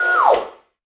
Deployment Un Assign Guard; Prison Architect

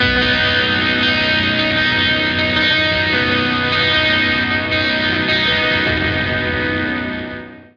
Hi Gtr

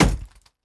ice hit; Teardown; PS5 Windows Xbox Series XS gamerip 2022

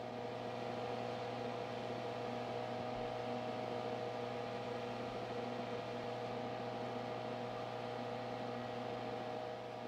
light computer

